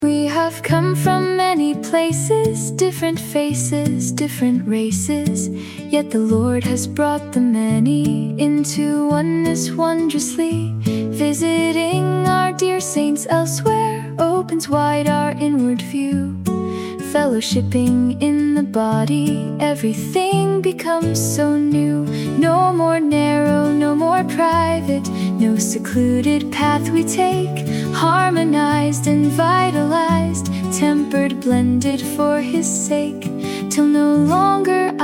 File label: sing, hymn; New Songs